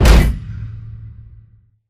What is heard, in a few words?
pod door close start; Titanfall 2